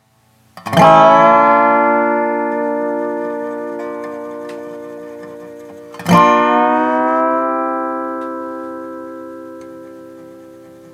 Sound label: Dobro FX